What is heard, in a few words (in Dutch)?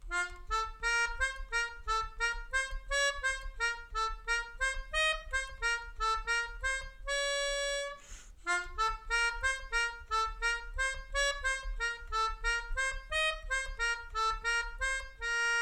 Mijn Melodie, Melodica